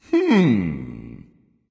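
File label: thinking, nonverbal; smite; voicepack; khepri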